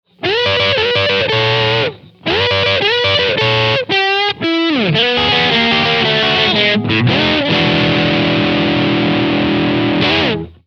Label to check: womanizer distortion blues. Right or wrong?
Right